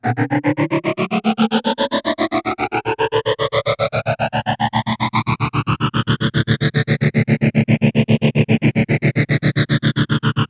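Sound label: guitar, Moogerphaser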